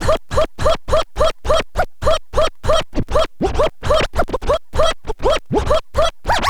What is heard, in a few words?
scratch med, Vinyl Attack scratches mix